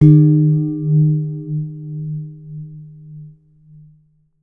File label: REFRIGE C, MYSTERY BELL